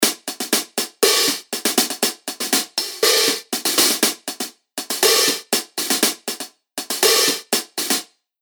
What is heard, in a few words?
Zildjian A Custom 16 Inch Crash Hi Hats